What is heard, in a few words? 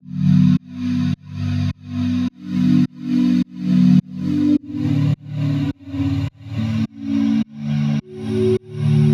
105 loop duo synth chords soft Dmaj